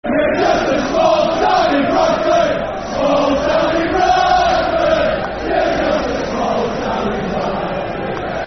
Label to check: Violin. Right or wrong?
Wrong